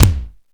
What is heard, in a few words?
bass, AKAI XE-8; Greytsounds